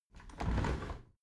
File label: window handle squeak turn open halfway regular; objekte; fenster